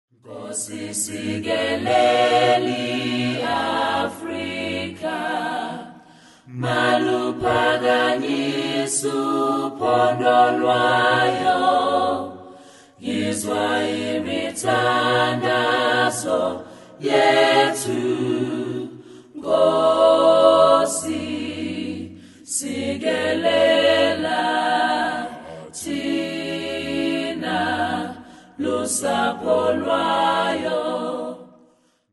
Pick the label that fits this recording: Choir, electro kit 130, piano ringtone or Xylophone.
Choir